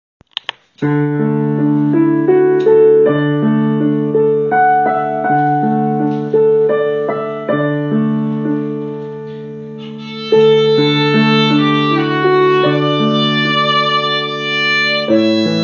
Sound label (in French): Violon